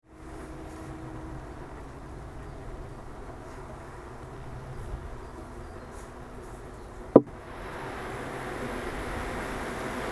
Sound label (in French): abeilles